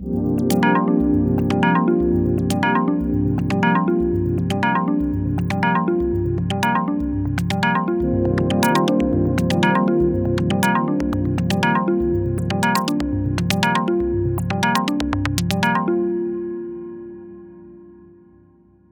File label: Cm Texture Full, 120